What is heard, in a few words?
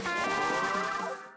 speed boost; discord